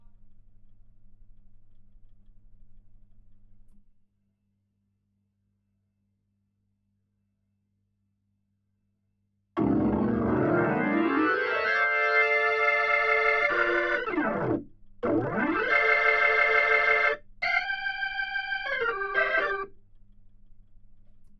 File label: HAMMOND